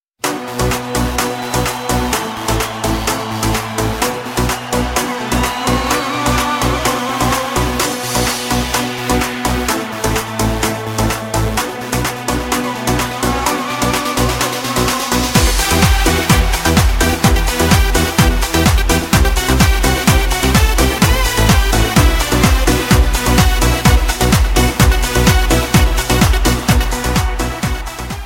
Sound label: instr